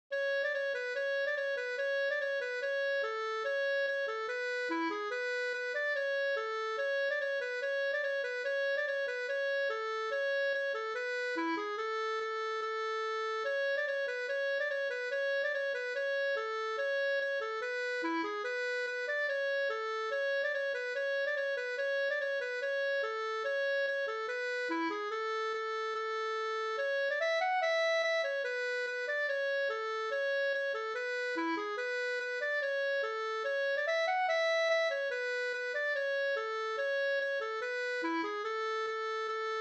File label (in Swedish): reinlender